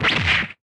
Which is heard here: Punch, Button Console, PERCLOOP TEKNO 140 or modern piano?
Punch